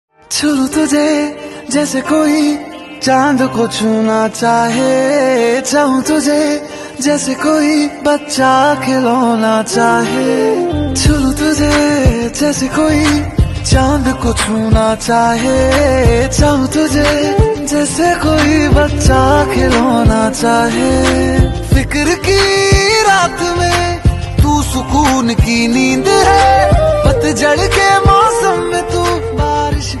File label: Hindi Song